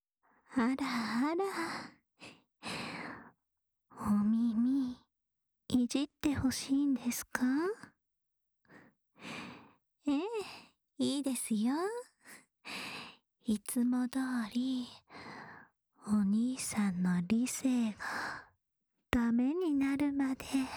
安眠用梵天耳かき（左耳）

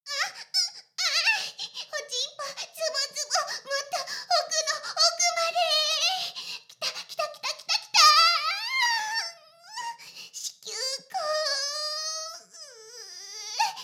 Ｈな音声; ASMR; ダメなメイド

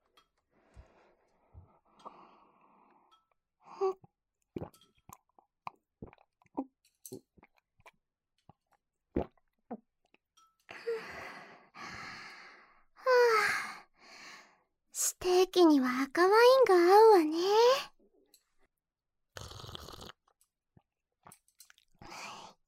咀嚼音, ASMR

